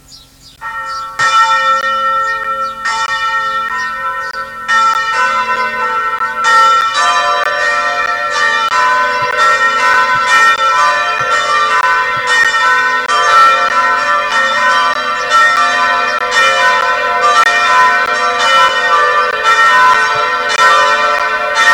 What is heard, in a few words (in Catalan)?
campana